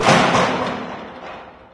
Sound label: door metal large open; Garry's Mod; hl2